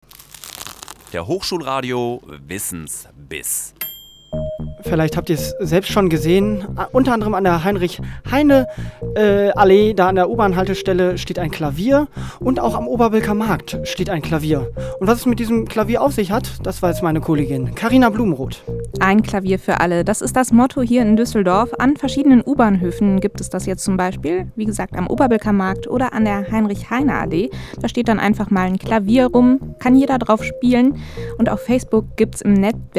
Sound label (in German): Klavier